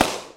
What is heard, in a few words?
auto rifle single fire